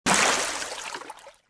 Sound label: hitwater, saber; weapons